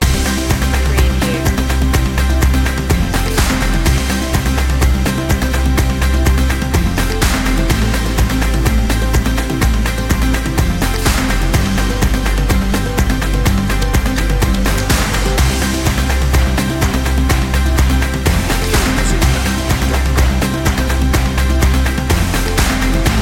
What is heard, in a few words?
folk coachella loop